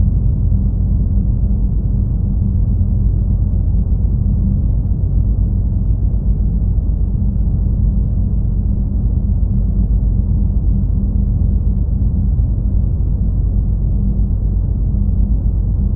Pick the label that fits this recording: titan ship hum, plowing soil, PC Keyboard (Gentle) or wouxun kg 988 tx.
titan ship hum